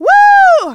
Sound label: Vocals, Adlibs; Houseworx